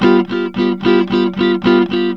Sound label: rock G 110, Vintage Blues Guitar; USB Soundscan vol.22; AKAI